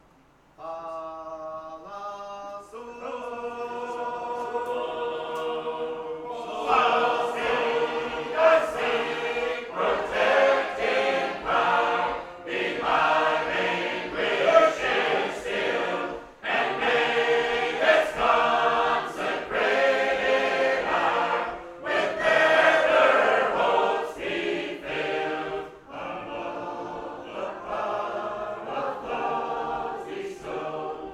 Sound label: Pleyel's Hymn (First)